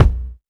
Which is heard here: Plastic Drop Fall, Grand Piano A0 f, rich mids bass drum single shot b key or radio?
rich mids bass drum single shot b key